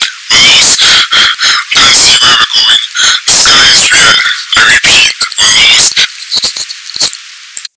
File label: radio